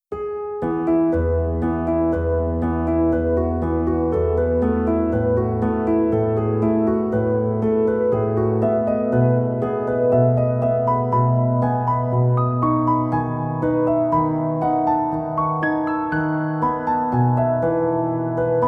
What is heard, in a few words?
Grelots, PIANO